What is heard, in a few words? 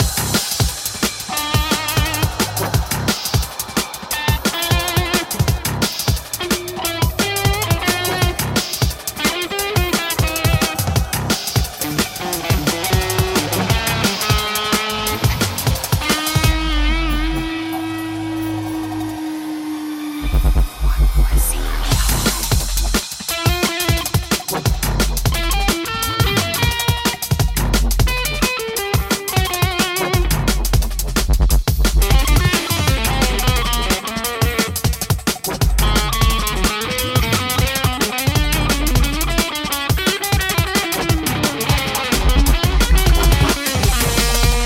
Guitar Remix